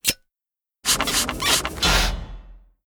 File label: boost; Animation Throwdown